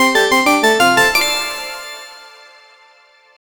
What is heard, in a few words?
victory